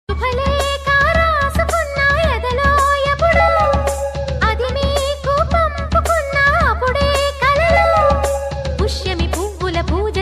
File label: music ringtone; huawei